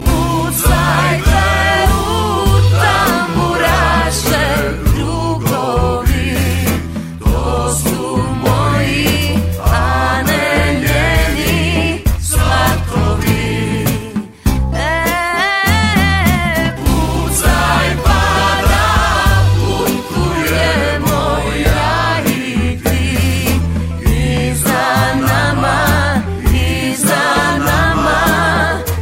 Tamburasi

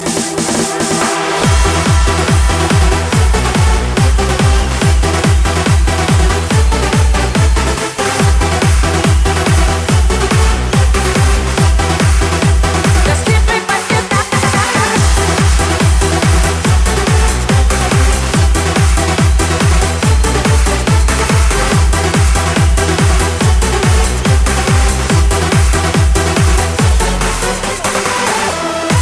House

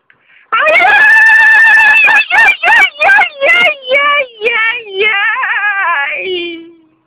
Scream Of Death; Tali

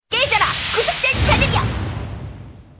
Shatter

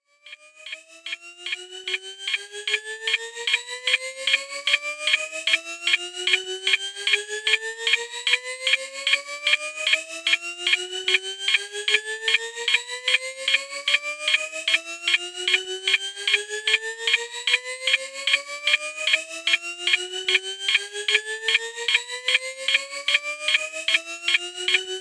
Super Mutant Beeping

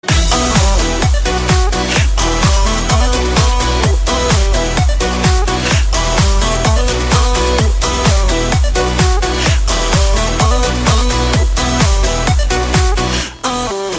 Dance Tone

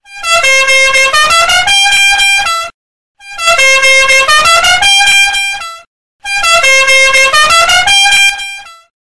Alarm Horn Wake Up

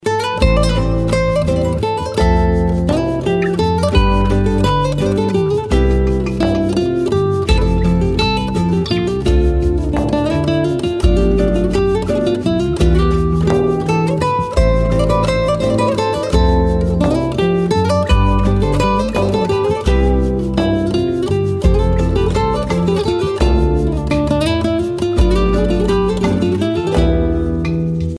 Nice guitar

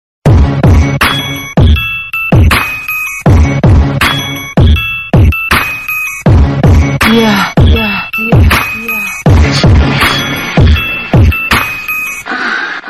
Super Bass Bass

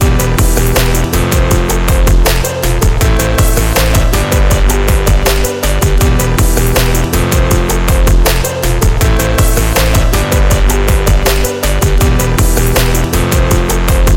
Future Bass beat

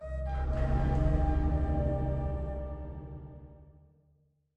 Gears Startup

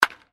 baseball; 365scores

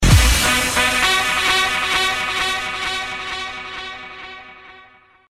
Watch Out Alert

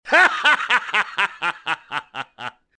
Haha; Ra2

